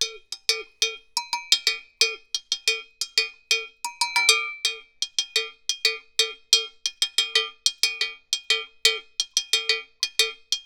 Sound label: Agogo Bells 90 bpm; Loops; Drums; Percussion; Ableton Live 12 Lite; Core Library